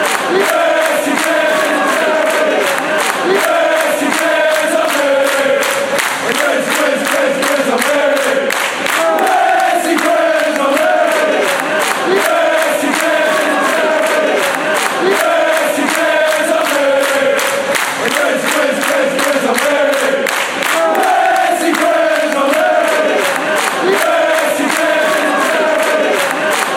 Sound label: racing greens army, chants